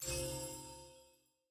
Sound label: UI Hover Light; bloom minigame